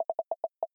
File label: Dials; Peloton